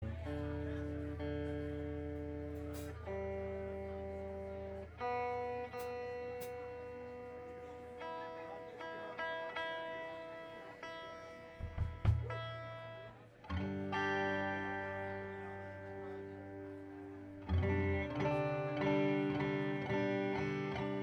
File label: tuning